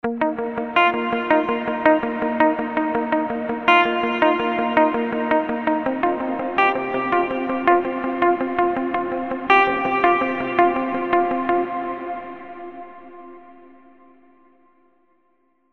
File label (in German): Gitarre Wet; Flashy 50